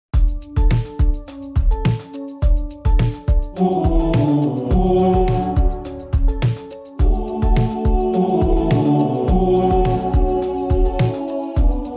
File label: SATB choir combo; koor muziek